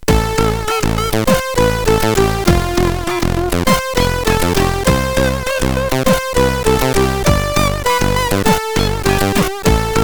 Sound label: Jingle, sid CSG8580R5